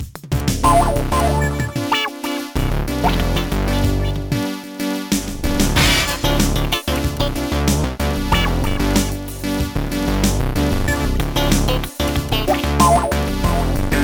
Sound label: synth jazz funk; Fuzz; Trackers; Modland